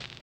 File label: RAGGA; Spectrasonics; Metamorphosis; RAGGAVYNL